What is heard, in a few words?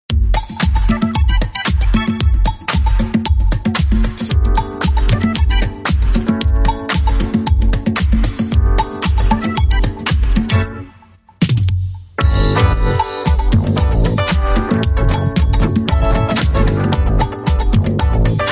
Trance, dubstrumental